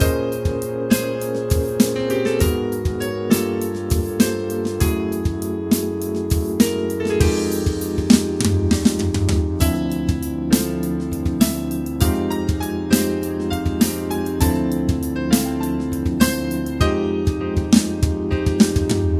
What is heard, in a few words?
jazz